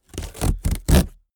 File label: cardboard box big rip open